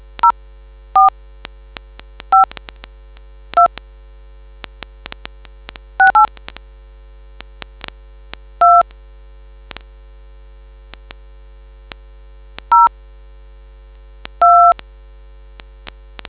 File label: dtmf